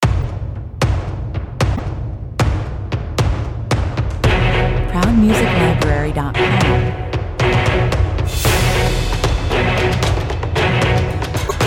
percussive mix, 114 bpm

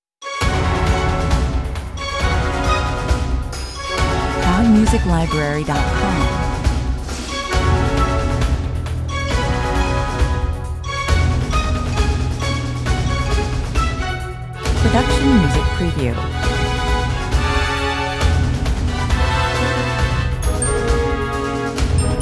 commercial

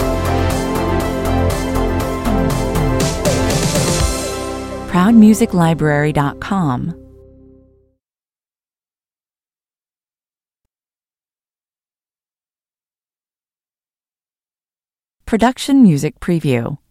synth wave stinger

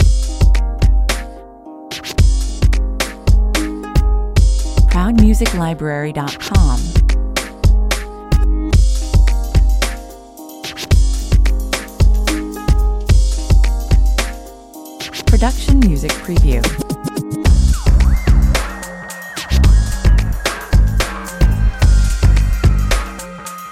beat, 100 bpm

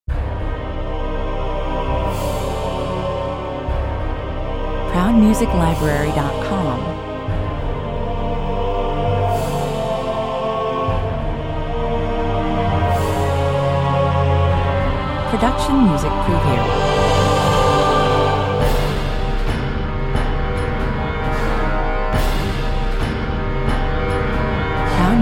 trailer 70 bpm

